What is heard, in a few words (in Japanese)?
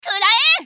攻撃短; カルヤライネン